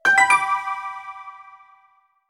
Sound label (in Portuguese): Sinos; Lembretes Notificacoes Sucesso